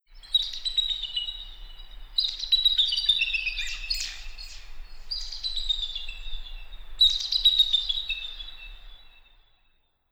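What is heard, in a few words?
Yosemite Eagles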